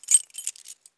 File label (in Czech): klice; Mafie 1